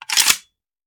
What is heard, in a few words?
Machine Gun Foley; Sound Ideas; Guns